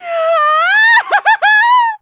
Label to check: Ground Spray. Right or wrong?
Wrong